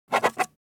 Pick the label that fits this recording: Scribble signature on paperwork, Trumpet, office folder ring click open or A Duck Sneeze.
Scribble signature on paperwork